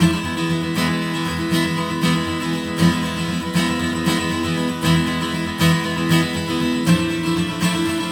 guitar, stringed instruments; Sonic Foundry (Sony Creative Software)

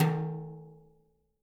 BRUSHES, Living Drums

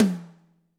TD-20 Tom A, Roland TD-20 Toms

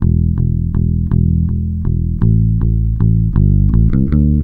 HEAVY E, Bass Grooves; USB Soundscan vol.30; AKAI

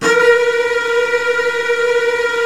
A#3, Strings, STR HLF TRLL; ILIO; Kirk Hunter Virtuoso Strings VOL-3; 90 s Sample CDs

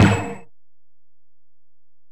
Ac Perc, Drum Machines Alesis DM-PRO

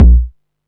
BD SOFT, Techno 138 BPM

